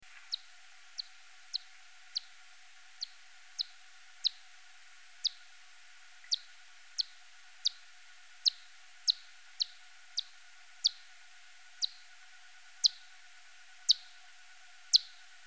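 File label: Myotis macropus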